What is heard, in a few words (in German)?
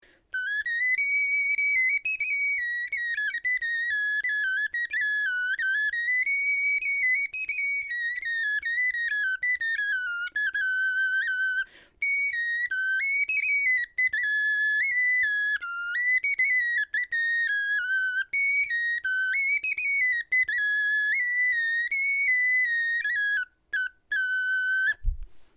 melodie, knochenfloeten